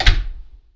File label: Door Shut